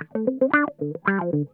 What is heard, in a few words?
WORMY, Funk Guitar; Zero G - Funk Guitar